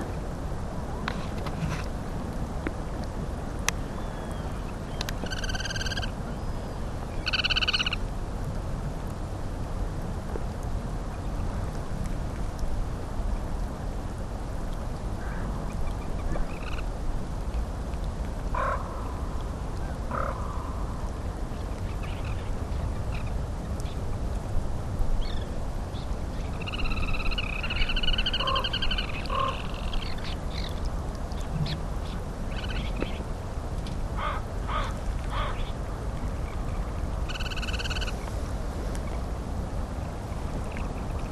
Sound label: Shorebirds; Port Krestof